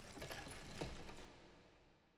ambience room debris; necromastery

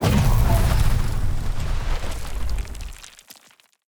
Fire Sphere Explosion; TANK; 3Souls

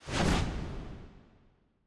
ambience room shadow

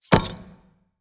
morgenstern grab; player

